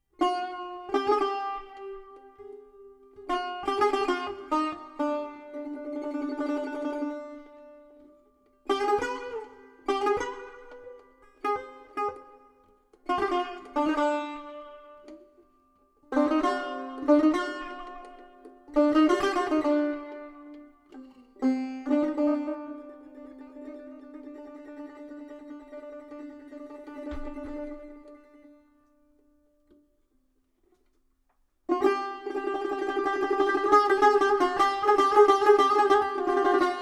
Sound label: Dastgah